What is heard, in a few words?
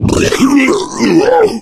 male boomer shoved; voice; Left 4 Dead 2